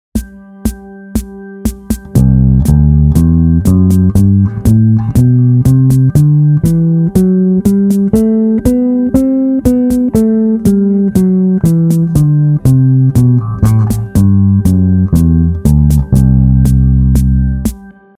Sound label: Lydian Mode, bass; Lydian groove can emphasize the sharped fourth; Jazz musicians often substitute Lydian mode for the major scale to create a brighter, more modern sound.; a bassist's guide to modes